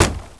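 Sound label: smashing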